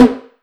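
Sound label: snare processed, Disco House; USB Soundscan vol.20; Fresh Disco House I; AKAI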